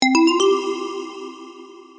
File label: agent Assigned notification